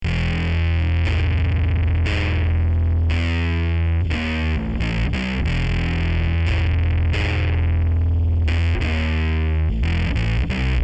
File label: bass